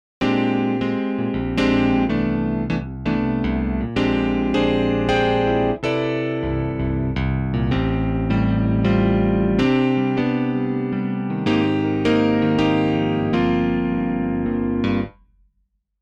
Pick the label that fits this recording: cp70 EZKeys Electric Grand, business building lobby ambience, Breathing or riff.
cp70 EZKeys Electric Grand